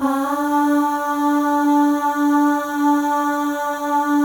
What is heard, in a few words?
AHS, FEM; Spectrasonics; FEM.1 AHS